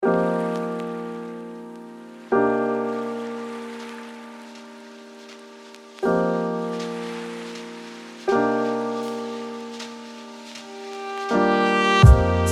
lofi beats

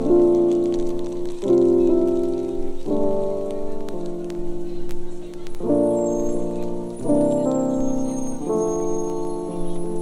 Piano, Lofi

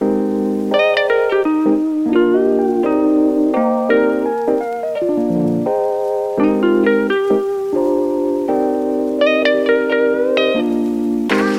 Summer rain, lofi cafe

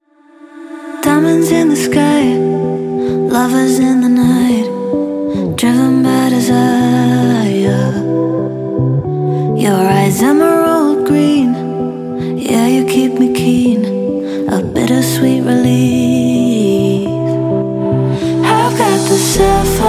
Blues

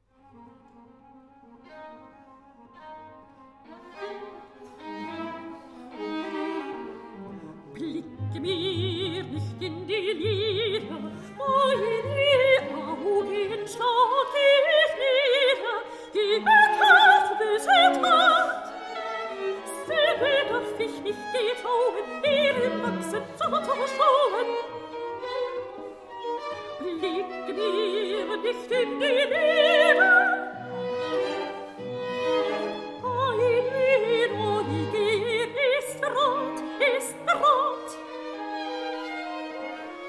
Voice & String Quartet; Rückert Lieder; Arr. for Voice & String Quartet

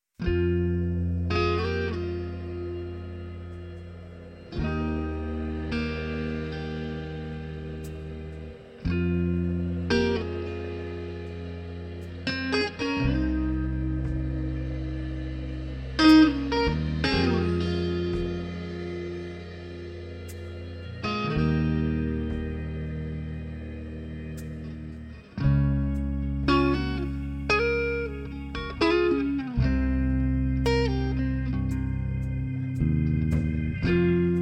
Blues

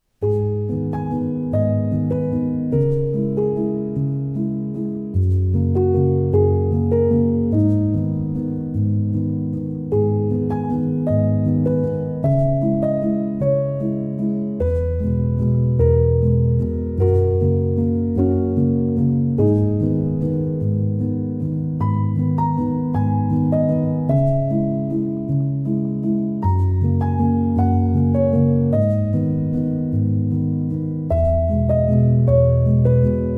Love Waltz

